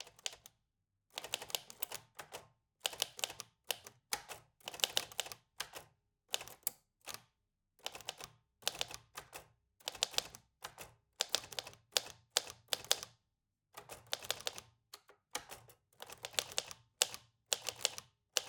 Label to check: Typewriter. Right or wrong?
Right